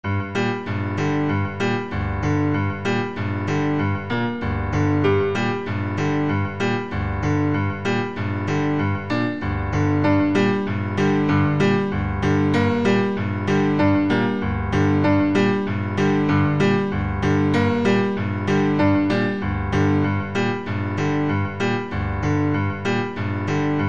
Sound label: Undead Piano